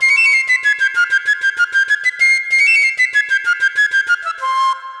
flute yorbis, Loops, Jazzmatazz

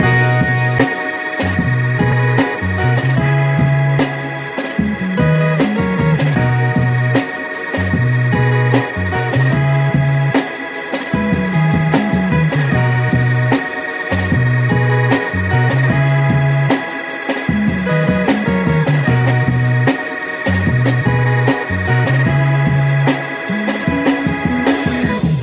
full, Loops Phonky

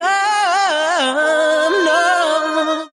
"um" SUNG

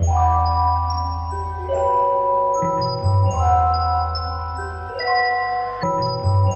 keys, jazz loops

